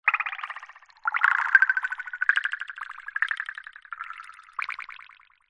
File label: science fiction warped water drips; zapsplat